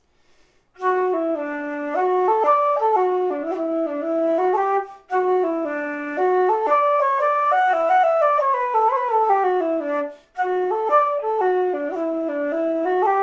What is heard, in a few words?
Galway Hornpipe flute